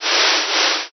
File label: towel